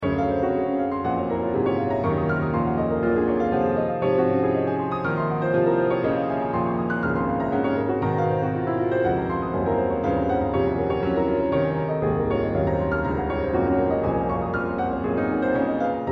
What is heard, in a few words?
passing rain, piano; 通り雨